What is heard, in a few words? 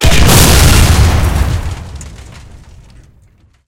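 tank hit armor-piercing high-explosive big; aphe; sfx tanks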